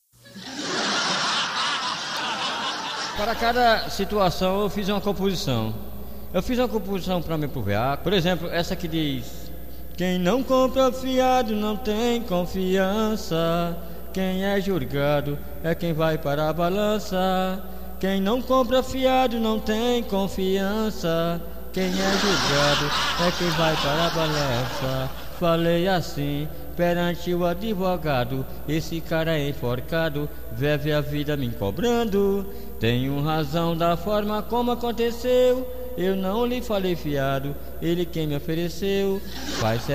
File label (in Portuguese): o samba